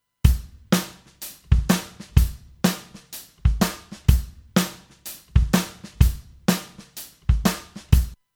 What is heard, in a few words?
Drums Original; Maeag EQ4M